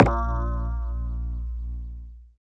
SYN, DANCE SYNTH

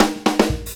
FILL, Grooves Filz Hitz; PlatinumPhattCD1; E-MU Producer Series Vol. 8 – Platinum Phatt

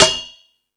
Tool Kit, Drum Machines E-MU Pro-Cussion

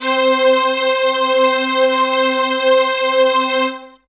Strings C4; Zero-G; Total Drum Bass

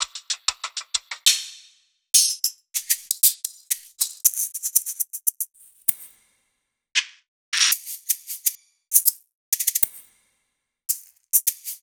Two Shots Shaker

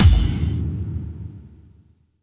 BD-FX, Dance Mega Drums; Best Service

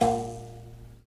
Mouth Bow, Africa; Roland LCDP11 Africa VOL-1

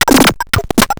grind, EMU XL-7

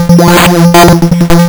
lsdj waves; wavetables

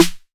808 SD Tape Sat, Snare Drum; Goldbaby Tape808 & Tape909

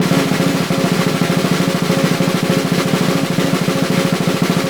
SNR, Synclavier Percussion 2 - World & Orchestral

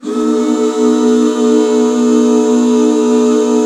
B 7, back chords; Sound & Vision - Gigapack II CD 1

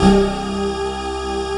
HOLLOW SYNC, SYNTHS; Pro-Rec - Synthsonic

